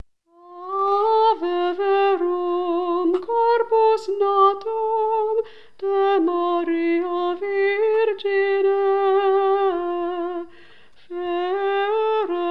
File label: Ave verum corpus chant